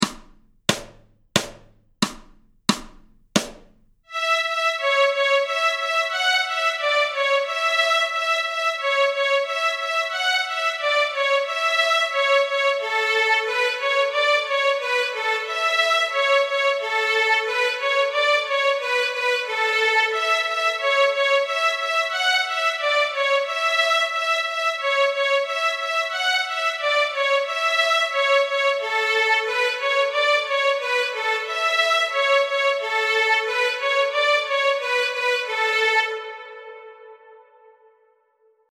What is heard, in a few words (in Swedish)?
Slängpolska Stråk A, Nyckelharpa; Slängpolska från Småland; Notträning